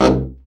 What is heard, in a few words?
BRA BARI, Bariton Sax